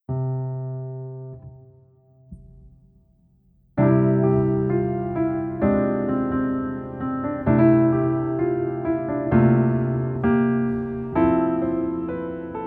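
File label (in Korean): Piano version 멜로디 MR -1키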